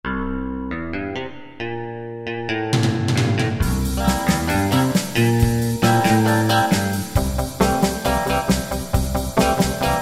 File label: Liverpool Pop; Preset Styles